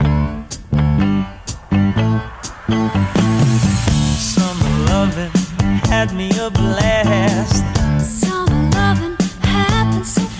124 bpm D

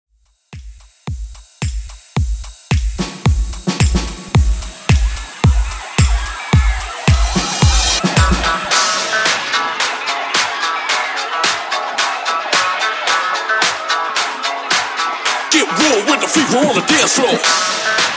Classic Redrum 110 Bpm

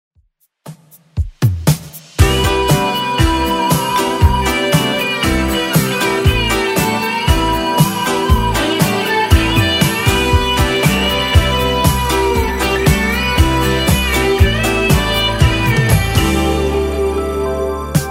80s 118bpm; Redrum

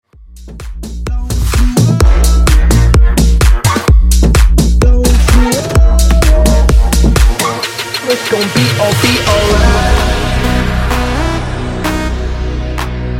Hot Segue 128 Bpm

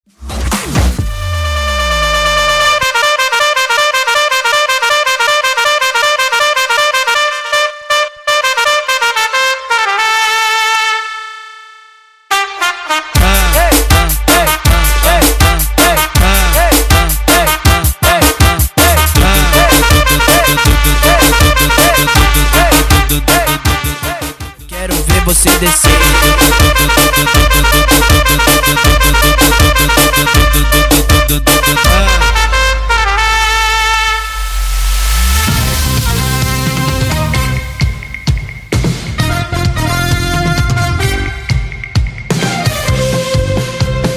Mashup Bass House

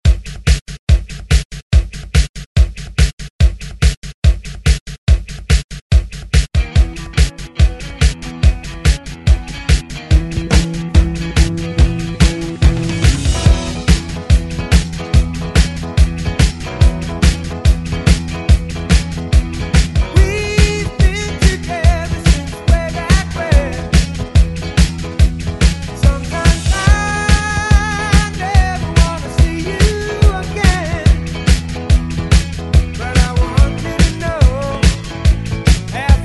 Re Drum 140 Bpm; DJDOLLS

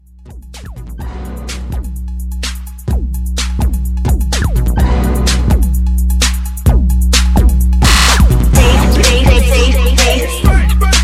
Scratch Hype Mashup 127

